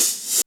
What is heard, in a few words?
REV HIHAT; Back In Time Records; Big Bang; ST.REV.HH+CY